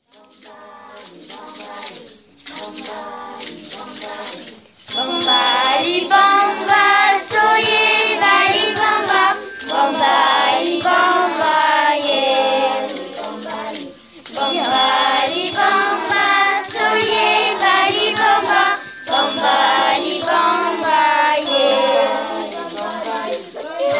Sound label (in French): bambali 2 voix